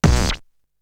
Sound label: Hit; ZERO G - Datafile DISC 3